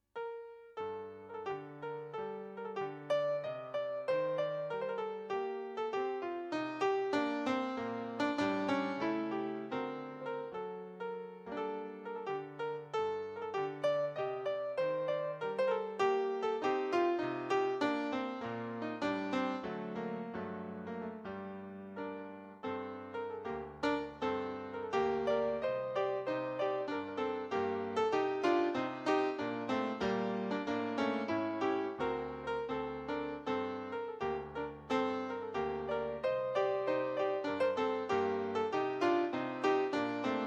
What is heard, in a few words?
piano